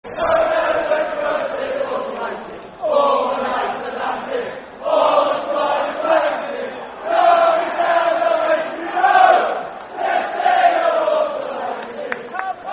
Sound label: Football Chant; To See The Walsall Aces; Walsall Wfc; fanchants